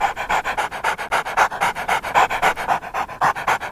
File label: dog panting loop; Ringling Bros. and Barnum & Bailey; Wii